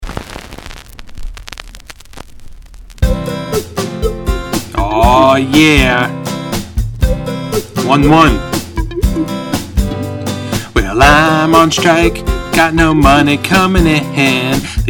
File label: blues